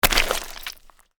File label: goopy slime